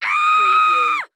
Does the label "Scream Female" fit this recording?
Yes